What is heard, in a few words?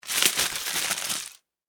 Foley Tin Foil Crumple; Crunches (Sound Effects:Objects)